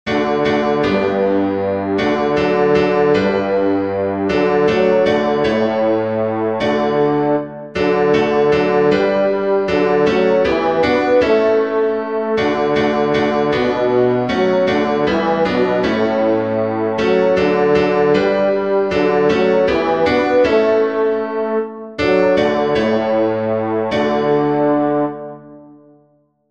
victory bass; by Giovanni da Palestrina (1525-1594)

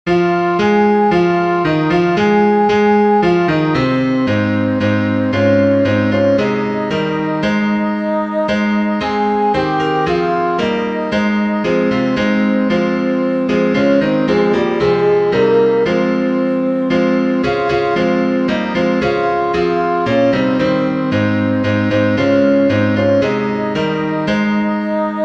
restoration alto; “Come You Sinners Poor and Needy”

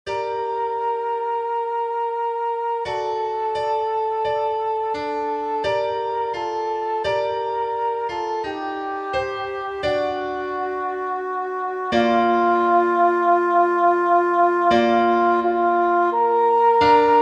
vere languores nostros soprano ii; by Antonio Lotti

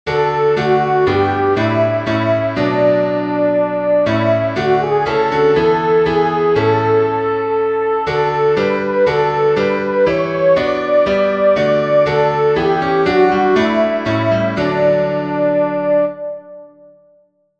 swabia soprano; by Johann Martin Spiess (1715-1772)

